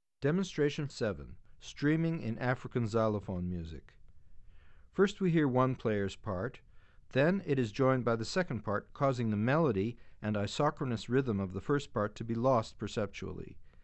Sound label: Streaming In African Xylophone Music; Bregman ASA; auditory demonstrations